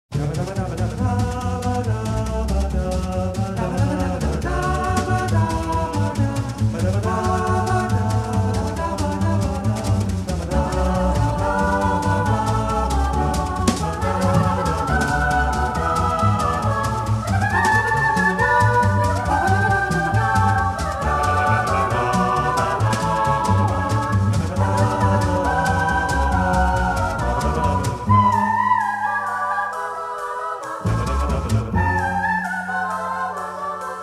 Fuga n. 5 in Re Maggiore; BACH; Das Wohltemperirte Clavier; Tell 1, BWV 850

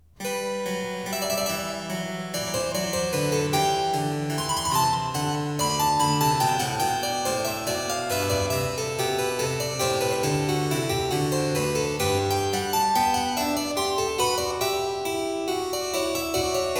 Variatio 2 a 1 Clav.; BACH; GOLDBERG VARIATIONS

